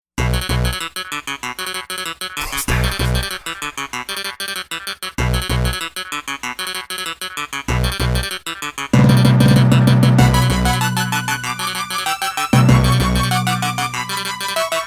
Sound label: Metal Reggaeton Instrumental; Beatmart